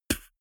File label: beatbox bass drum dull damped